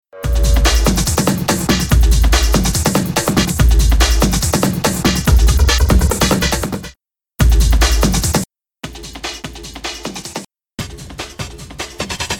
Break

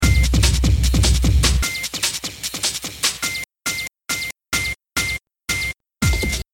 Snare

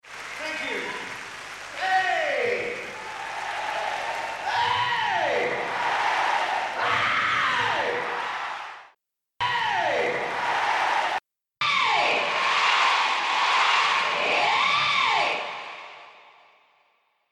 Shout ('hey!') & crowd noise; Full Throttle

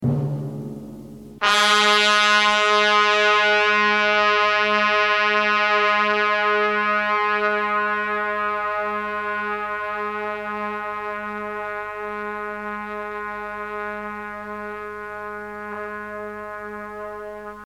Trumpet

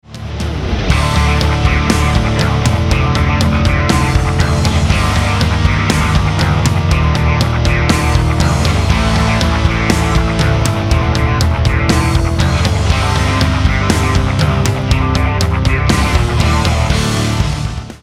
modal interchange

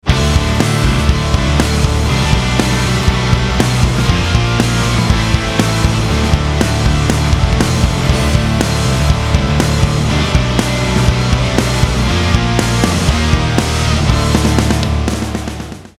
modal interchange - chorus

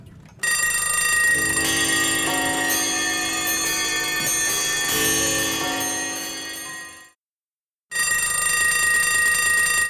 Alarm clock bell; Claustrophobic Sting

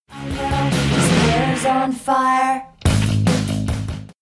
vocals ('is on fire')

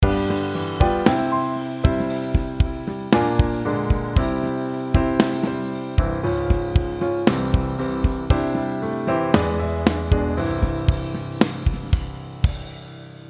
dominant chords subs to tonic

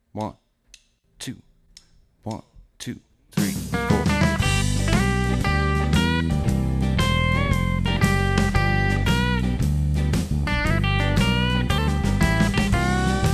Solo

